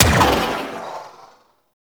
Railgun Biped Shot; Warframe; Venus

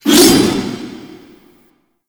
Berserker Barrage Equip A; Warframe; Warframes

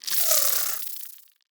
Inf Uzi Proj By; Infested; Weapons

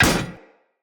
Hammer Impact Metal; Weapon Impacts; Player; Environment; Tuvalkane Hammer

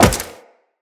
Wall Jump Metal; Tenno; Footsteps; Wall Run

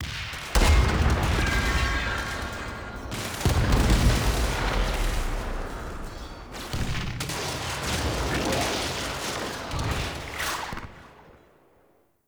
Ambient Battle Close; Warframe; Tau

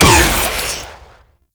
Shot, Infested Beam Pistol; Coda Catabolyst; Weapons

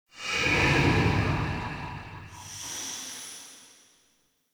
UI Boss Stinger; Hud UI

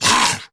Melee Attack Grineer Ghoul Sargeant; Grineer Ghoul Drill Sargeant; Battle Barks

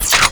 Shape Blast Shotgun Reload, Weapons; Warframe; Corpus

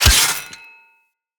Melee Sword Impact Robotic Flesh

